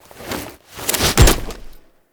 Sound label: Throw Hit; Warframe; Cinematics; Sacrifice; Sacrifice Arsenal